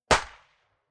Firing Range Gun Ak47 Single Shot Slapback Echo Urban Enviroment; Weapons; Rifle; Rifle Sound Effects